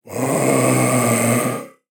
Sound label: Skeleton Warrior Attack Vocals